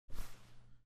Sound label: Reload Start, Super Shorty; zps; Weapons; Firearms